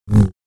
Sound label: Saber; FXHome